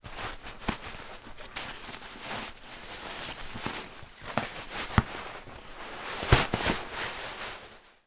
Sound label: foley sleeping bag remove from cover bag